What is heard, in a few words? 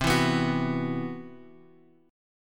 C Major 7th Flat 5th, chord; CM7b5 Chord {X 3 2 X 0 2} strummed